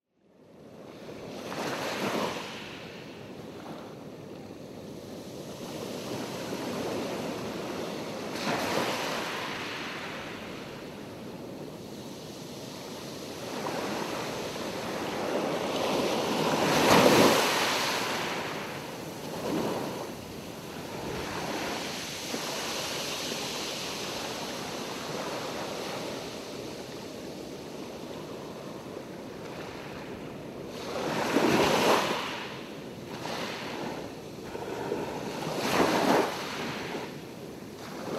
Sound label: Fiji Beach Stereo